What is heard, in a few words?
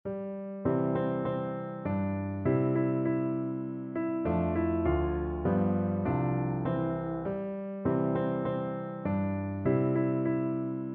christmas tree, piano duet